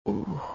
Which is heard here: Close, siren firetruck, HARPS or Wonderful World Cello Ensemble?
Close